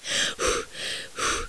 atmung; Tomb Raider PS1

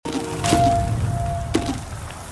heilen; The Flame in the Flood

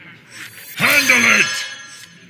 ausspielen mit monster; hearthstone

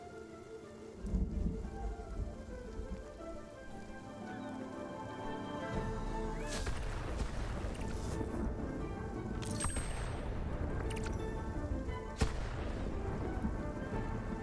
Gewitter; spiritfarer

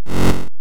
skiing kollision

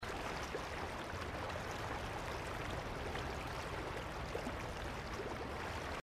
schwimmer ueber wasser; inside

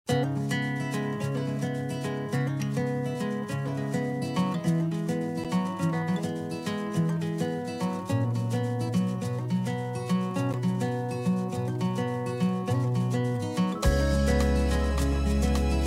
musik gang schule; life is strange

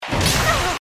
Schlag; arms

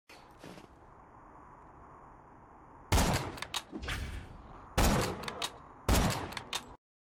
waffe; borderlands 2

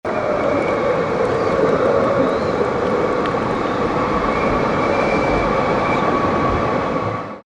ambient sound unterschiedliche umgebung; it takes two

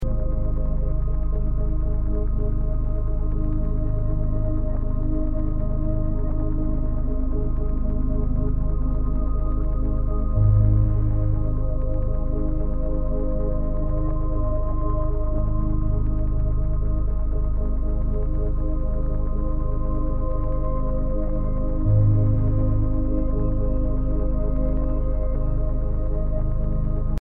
sturm musik; life is strange

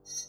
karte durch die karten switchen; magic 2014

